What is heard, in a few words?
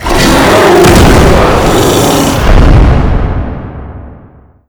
roar; slender; quadralex